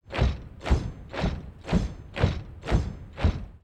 march walk

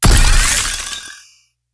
skill mag hit

